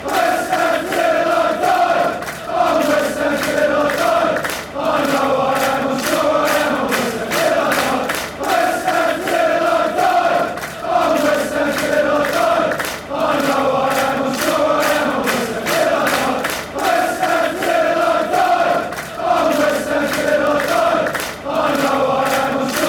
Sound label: west ham till i die, fanchants